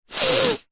human sniff hard blocked nose; zapsplat

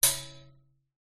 impacts metal cage small hit with metal object

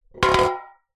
tin metal cylinder lid on knock over on concrete; foley; zapsplat

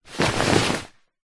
foley clothing shirt grab pick up

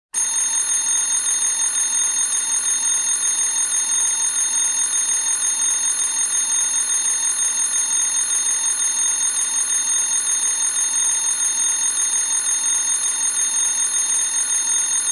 emergency alarm bell ringing

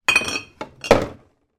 household wine bottle place in fridge door

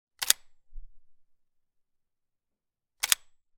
gun model 12; still north media

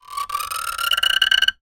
wood pole turn squeak; foley; zapsplat

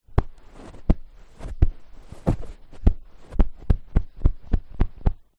household cushion handling pats; zapsplat

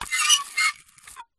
Metal Dry Ice Candle Lid Rough; sound spark

